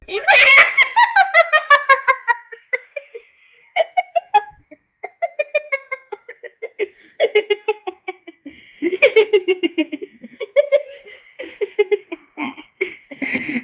terrifying pixelated laugh

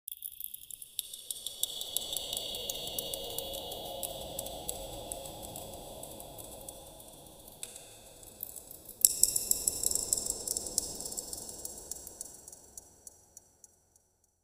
magical cave ambience

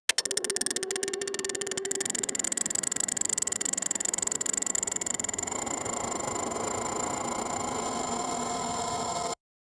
create a short dynamic sound, casino game

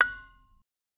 a pachinko brass pin hit

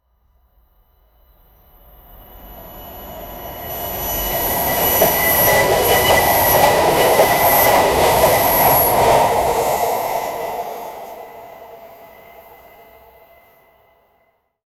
a train travelling from

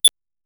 pixel style sound of ui

